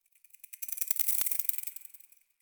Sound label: Foliage Insect; Warframe; Duviri